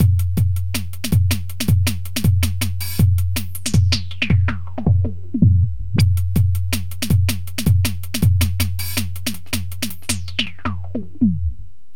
drum, Drum & Bass; Best Service ProSamples vol.03 - Drum & Bass 1; AKAI

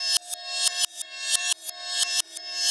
Cymbol Particle; Particles

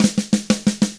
122 SHUFFLED, Drums; ILIO; Double Platinum Drums 1

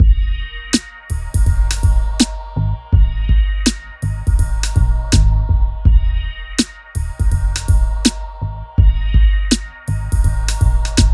MIX, Hip Hop and R&B Drumloops; Best Service; ProSamples vol.01; AKAI

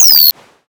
Star Instruments Synare PS-1

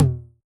Tom, Yamaha DJX 2B

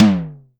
SIMMONS SD-5 drums

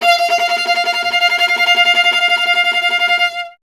viola F; Miroslav Vitous; Solo Instruments VOL-1

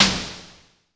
Drum X Snare; Pearl Drum-X

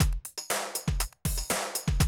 120, Classic Drum Loops

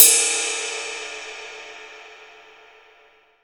IST 22 TIP, IST RIDE

